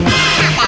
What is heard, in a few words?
STAB LO REZ; AMG - Vince Clarke Lucky Bastard